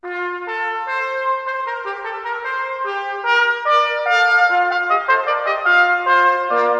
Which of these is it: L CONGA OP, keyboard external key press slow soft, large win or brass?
brass